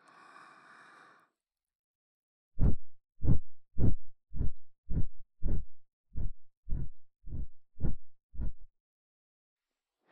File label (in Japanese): 【右】耳ふー, ASMR